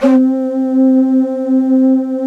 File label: shakuhachi; Greytsounds; Scott Peers Sound Engineering